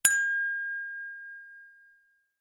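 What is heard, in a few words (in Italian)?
notification huawei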